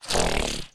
Pain

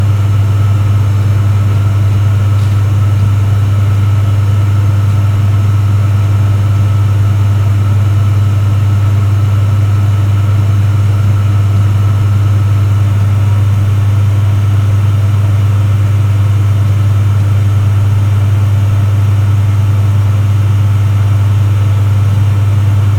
Office Background

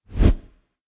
Whoosh Short

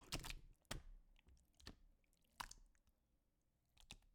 Blood And Guts Torn Out

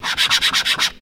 Scratching Paper

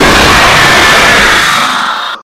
Loud Jumpscare

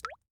Water Drop

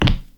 Wood Step

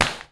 Slap Concrete

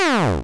Retro Video Game Sfx Fail

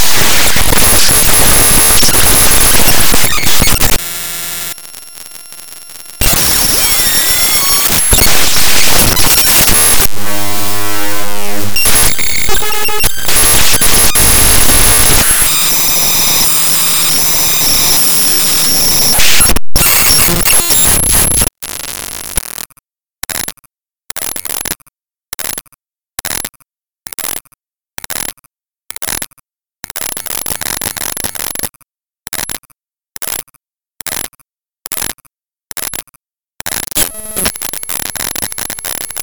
Audacity Raw Data

